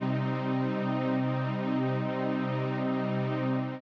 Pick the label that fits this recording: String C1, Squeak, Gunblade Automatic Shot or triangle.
String C1